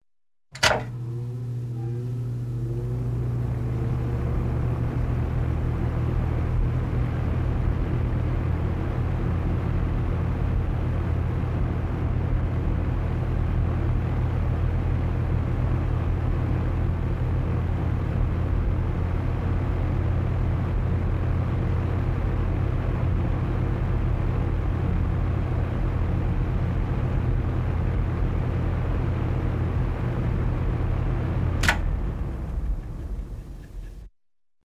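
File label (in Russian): звук вентилятора пуск работа стоп низкая скорость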